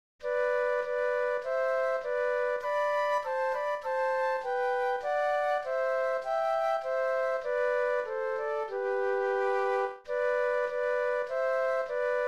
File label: Flute Duet